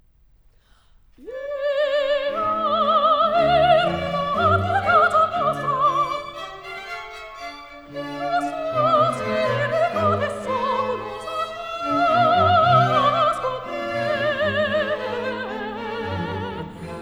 Salve regina, for (mezzo-)soprano, strings & organ in C minor; Eia ergo; Pergolesi